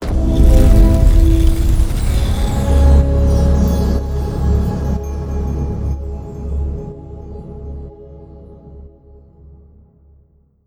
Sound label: free Spin Start; netent